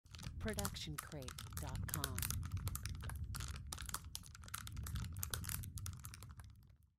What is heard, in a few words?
Outdoor Small Fire; SoundsCrate